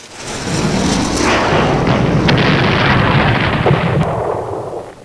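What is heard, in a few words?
jet combat; PARADISE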